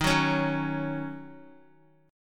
Eb Minor 7th, down, Guitar; strummed

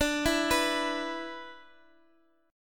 B-Minor Double Flat 5th

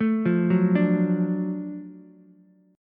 Gb Minor Major 7th Sharp 5th, Ukulele, chord; arpeggiated; JGuitar

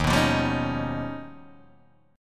D sharp Augmented 9th D sharp Guitar Open D

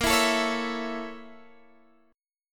Bb Minor Major 7th Flat 5th, Guitar, DADGAD

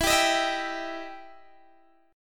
E Minor Major 7th E down Guitar DADGAD

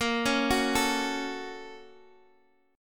Bb Minor Major 7th Sharp 5th, Guitar, DADGAD; x,x,8,6,9,7

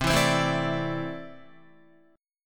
C 6th Add 9th C down Guitar; C6add9 strummed

